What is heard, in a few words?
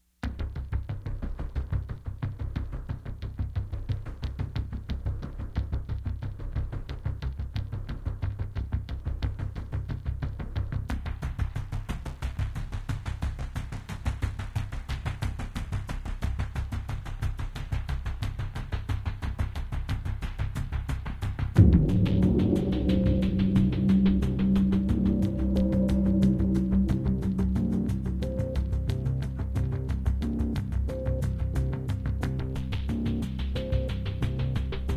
Pulsebed